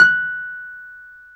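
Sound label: piano, Wurly, Keys of the 60s and 70s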